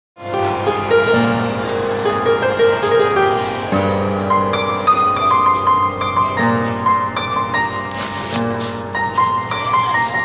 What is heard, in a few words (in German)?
Klavier; Iraker